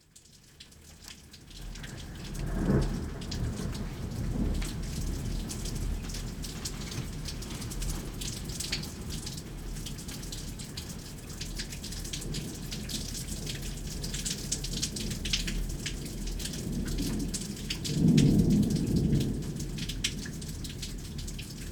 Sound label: thunderstorm; ihson clair